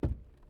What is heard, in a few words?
Kick Wood